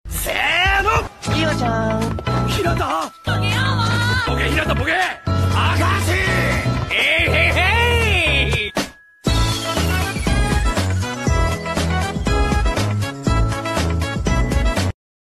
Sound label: ringtone; haikyuu